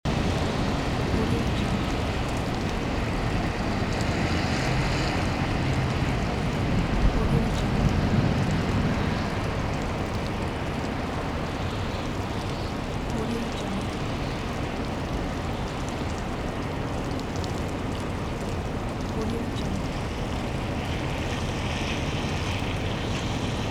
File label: rainy suburbs